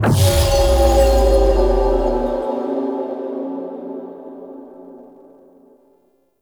Platform Activate Fail; Warframe; Ambience; Cephalon Weave

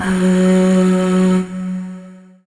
Void Shawzin Oct One F Sharp; Warframe; Zariman

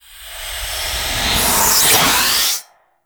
Zero G Pressurize; Warframe

